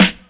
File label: sharp snare drum sample F sharp key, Royality free steel snare drum sample tuned to the F# note